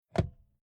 Transport Car Classic Back Seats Metal Ashtray Flick Open; Bentley Eight; Slowly